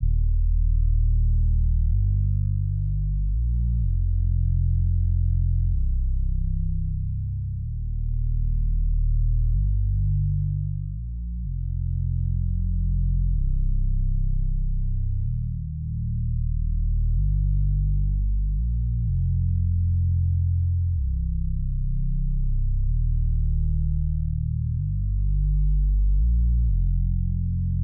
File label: 126 BPM G Min Bass, Hip Hop; Melody Loops; Loop Stems; Cymatics; Cielo